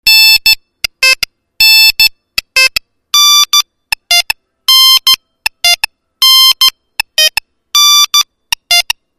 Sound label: siemens, Polyfonisk musik; midi